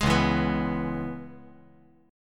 C sharp Minor 7th C sharp, chord; C#m7; strummed; JGuitar

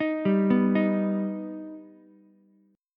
Eb Augmented Eb, Ukulele; Eb+ arpeggiated; 8,7,7,6; JGuitar

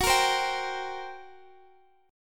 G Minor Major 7th Double Flat 5th, Gb

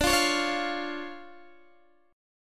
D Minor Major 7th D Guitar DADGAD

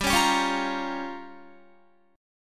G Minor 7th Flat 5th, Guitar; Gm7b5; strummed; 15,16,17,18,17,17

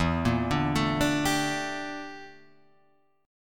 G Minor 6th Add 9th E

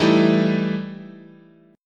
E Minor Major 7th Double Flat 5th, chord

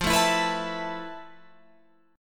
F Major 7th Flat 5th Guitar Open F, chord; FM7b5; 17,16,16,18,19,16; strummed

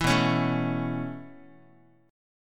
B 9th Suspended 4th B down Guitar; B9sus4 strummed; 4A,4E,5A,5D,5G#,6C#; chord shape; 7,7,4,7,x,x; JGuitar

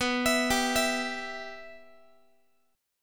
B Suspended 4th Sharp 5th B Guitar; Bsus4#5; arpeggiated; x,19,19,17,20,x

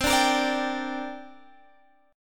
C 7th Suspended 2nd, Guitar, Standard; C7sus2 strummed

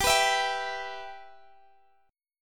E Minor G, Guitar

